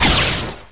Staff Unready, Weapons; Heretic II Demo

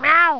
cat; Broderbund Demo

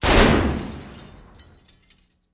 boom; wob2 demo

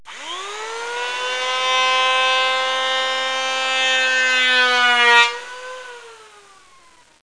plane; KABOOM! - Wild and Wacky Sound Software

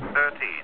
thirteen, chime

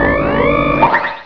Ramp; Loony Labyrinth

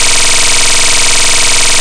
SINE; Atari HQ Archive #1; Demo Man

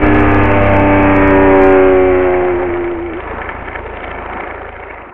rev down, powerboat; Smash Disk

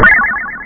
Clang

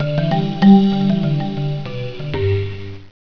Chords; Triazzle 1.0 Demo

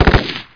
M4 3 shot; SpecOps2 MPlayer Demo

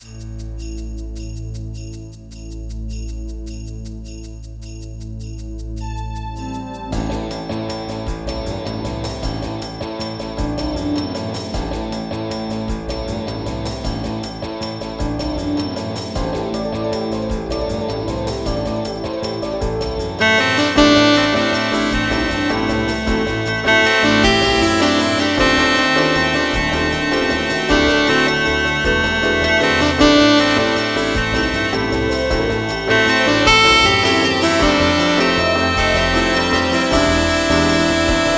LUN SAX

